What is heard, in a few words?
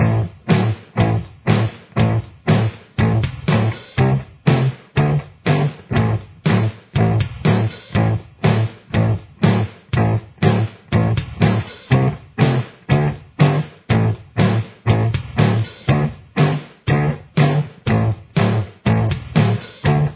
verse guitar